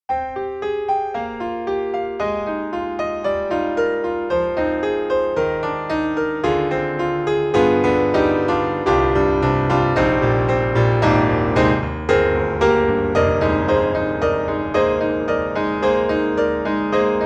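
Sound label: Bosendorfer 290 Close, Church, piano; Steinberg The Grand 3; Attender at Church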